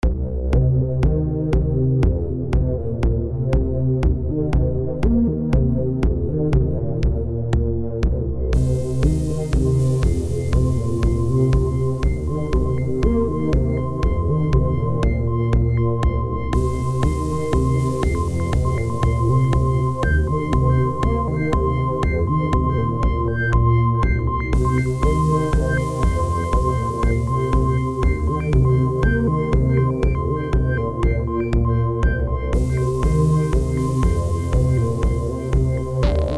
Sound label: beat drone